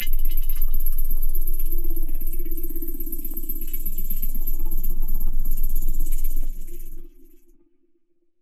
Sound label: Metalic Bees, techno drum fx; Single Hits